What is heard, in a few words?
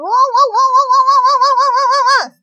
owowo